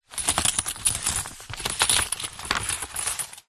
foley money coins movement in paper envelope

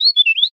bird chirp generic; animals

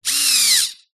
science fiction robot motor server movements

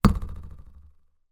cartoon balloon hit twang; zapsplat

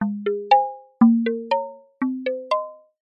cartoon musical expression mallets happy carefree; zapsplat

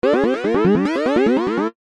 game sound retro manic falling negative

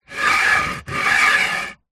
foley wood plank long drag hard ground; zapsplat

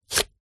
apple chop knife wooden chopping board

